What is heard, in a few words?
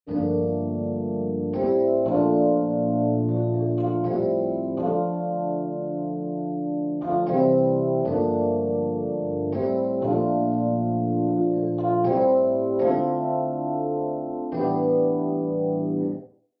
e piano wet